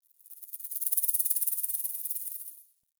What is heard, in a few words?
Forest Creature Rattle High; Warframe; Grineer